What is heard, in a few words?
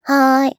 laugh, JPN; Eternal Return; Katja